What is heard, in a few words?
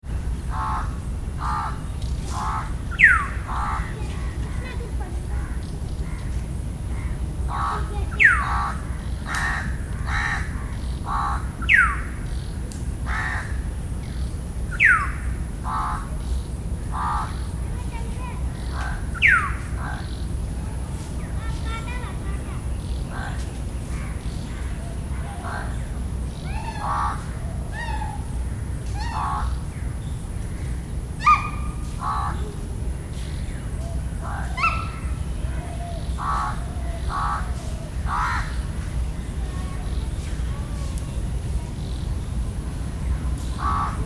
Calcutta botanical garden, India; Calcutta botanical garden#2